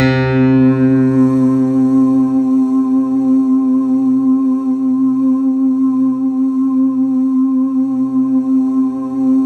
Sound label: C3, SynthLine; 90 s Sample CDs; NiceBeats; SynthLine VOL-22